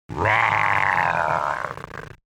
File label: rargh